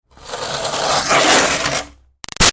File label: Snow Scrape Shovel Up Gritty; Yard Tools